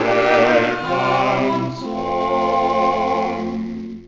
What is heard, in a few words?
quartet test